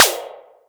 analog Bully snare; Ableton Foundation FreePacks; Wicked Kits

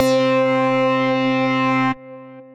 Stompbox Pluck, Synth Lead; Ableton; Chop and Swing

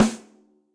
Snare Hit & Rim Direct Natural; Drum Hits; Ableton; Factory Packs; Session Drums Studio; MPE Hits

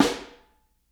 Snare Overhead; Ableton Foundation FreePacks; Snare Designer

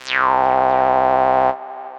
Retro Synths; Ableton; Opus

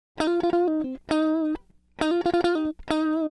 133 Dance El-Guitar S-Note F; Dance DJ

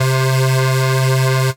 Sub Pwm, Synth Lead

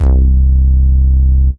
Uniform Bass, Analog; Ableton; Factory Packs; Synth Essentials

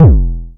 analog tonal, Kickbank

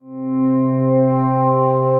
Swell Pad; Ethereal Pads; Ableton; Mood Reel

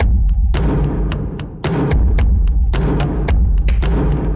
Ship Noise Kit - 110 bpm, drums; MIDI Clips; Ableton; Factory Packs; Mood Reel

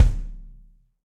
Kick Felt Low Warm And Low; Drum Hits; Ableton; Factory Packs; Session Drums Club; Standard Hits

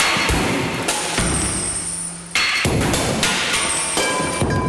Doom Drums Kit - 102 bpm, drums; MIDI Clips; Ableton; Mood Reel

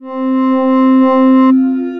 Synth Pad; Hokigai; Ableton; Glitch and Wash

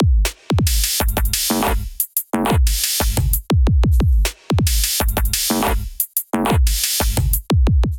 Kit, Drums; Face Melt Kit; Ableton; Factory Packs; Glitch and Wash

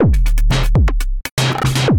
Steps, Sequence; Ableton; Creative Extensions; Racks; Neurothmic

